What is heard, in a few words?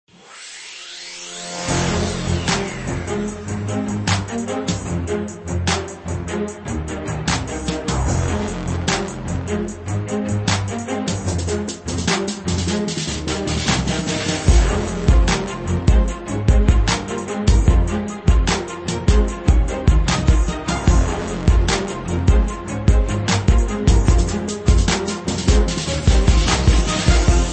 menu; nickelodeon basketball stars 2015